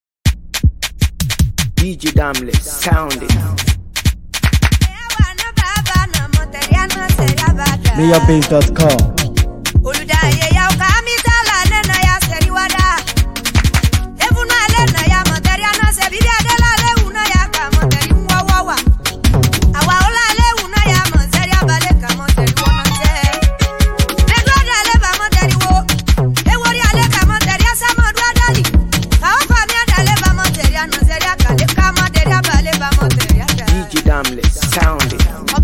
Spiritual Dance Beat

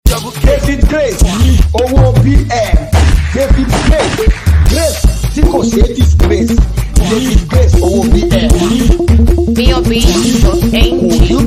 Beat

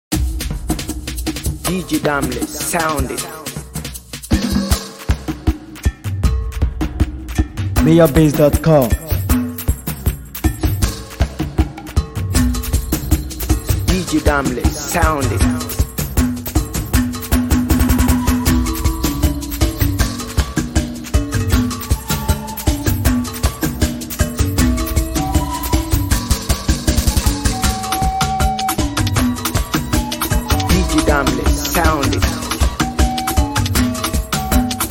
Jungle Beat

